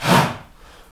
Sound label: "ha", utterances; Roland LCDP11 Africa VOL-1